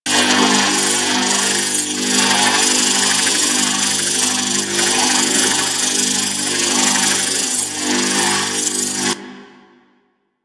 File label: Electrical Generator Loop; Ambience; Props; Warframe; Orokin